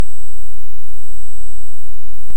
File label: Hydrophone; OneOcean